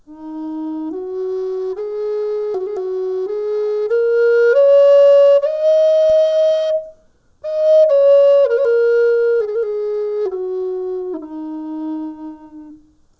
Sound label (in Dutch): Fluit